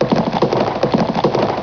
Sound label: hooves; halloween